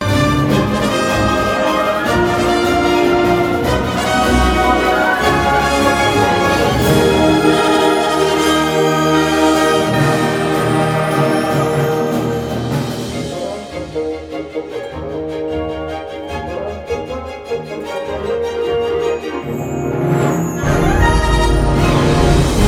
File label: Hero's Fanfare; KHIII